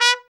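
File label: BRA TRUMP, trumpet, brass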